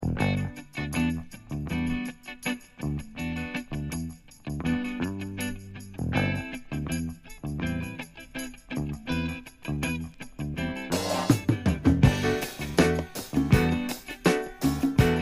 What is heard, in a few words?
Platinum Jazz